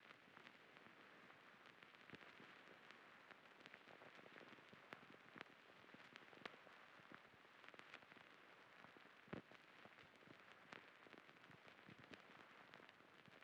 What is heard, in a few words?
100 BPM E Min Vinyl, Afrobeats; Melodies; Loop Stems; Cymatics; GHOST (Beta Pack); Alora